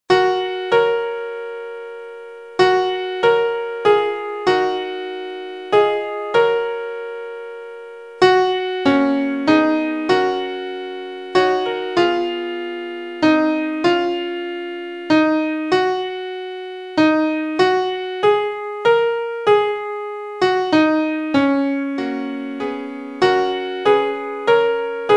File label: Piano